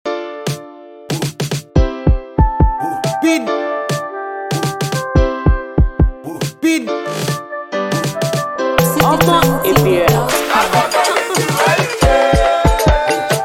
Cruise Beat

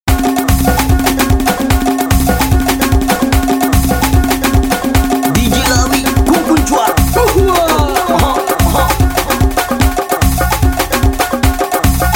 Beat

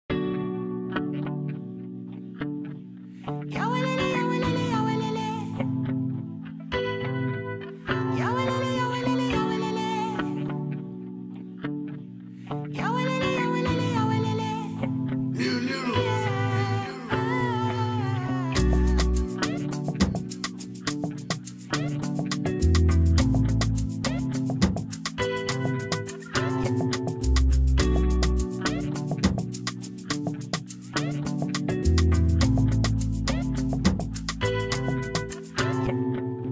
Instrumental

